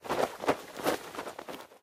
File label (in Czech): PRIVAZANI LANA; pat & mat game